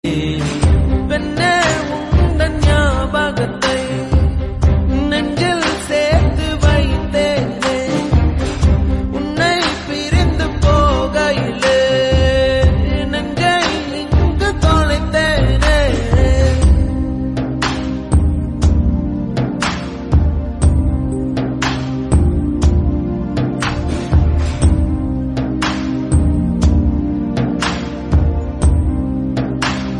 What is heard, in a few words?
Tamil Ringtone